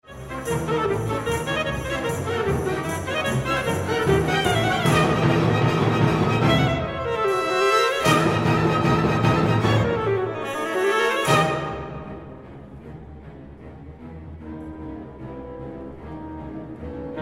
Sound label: Sax Con